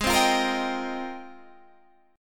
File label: F Minor 11th, guitar chord